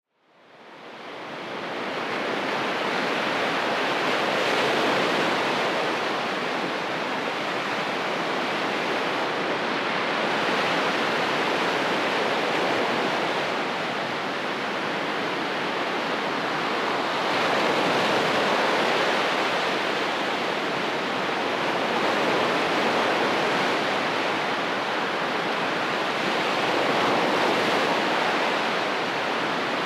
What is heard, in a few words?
Beach Waves Praia Grande